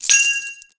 money pile break

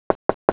user online

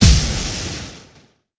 canon fire

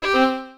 cancel; OneShot Fading Memory

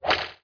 confirm button

